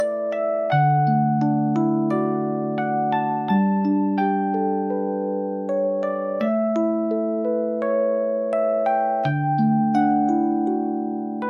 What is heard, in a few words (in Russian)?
Спокойные Колыбельные И Музыка Для Сна Ребёнка — Без Слов, Мягкие Мелодии И Атмосферные Звуки Ночи